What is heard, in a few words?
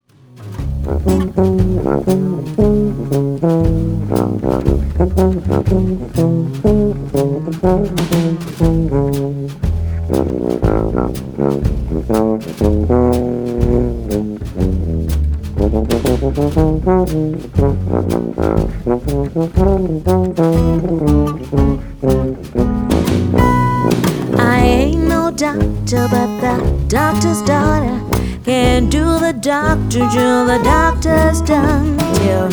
Blues